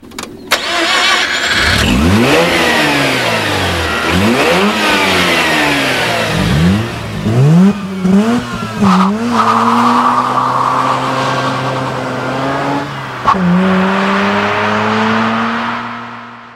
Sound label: rx8 takeoff, Rx-8 Start and Take off; Rx8 sounds